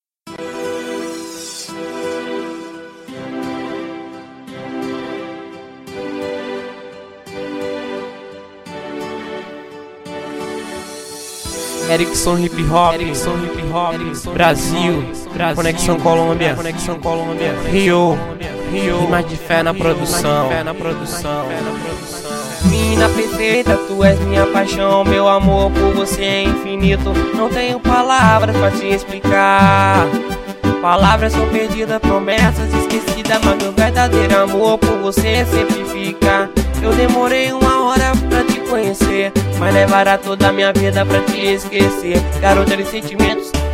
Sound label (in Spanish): reggaeton